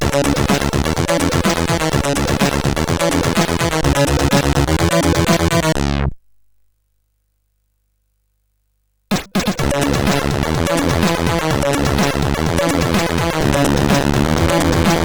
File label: Short Tunes, CSG8580R5